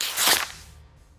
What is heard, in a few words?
paper out